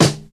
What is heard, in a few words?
short snare drum D key, snare one shot tuned to the D note. Loudest frequency: 1791Hz; snare drum samples